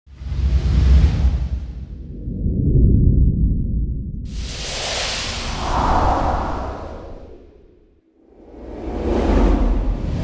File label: long soft whooshes, cinematic whooshes